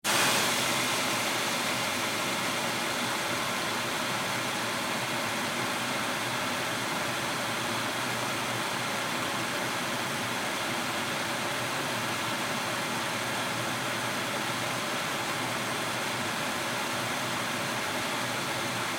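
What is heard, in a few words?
Water Spray interior Car Steady Med; Car Wash; Spraying; Objects; Big Room Sound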